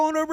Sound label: VOCAL; NAMM 2018; MyMix Recordings NAMM 2018